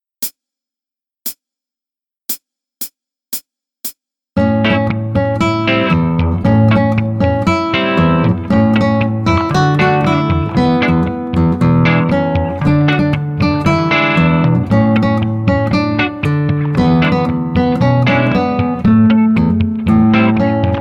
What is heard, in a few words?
swing